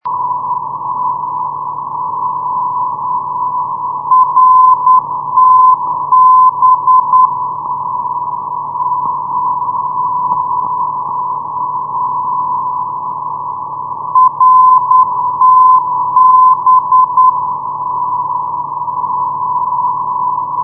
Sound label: ndb roethenbach 415.0; rtb; europe